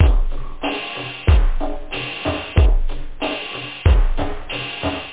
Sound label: bongo; OctaMED SoundStudio V1; techno